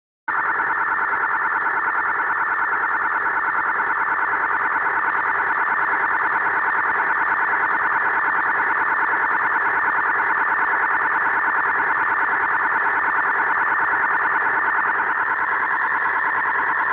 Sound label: control channel, QRM on 2m